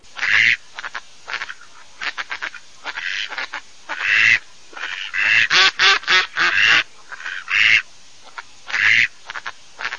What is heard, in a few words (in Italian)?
germano, birdsound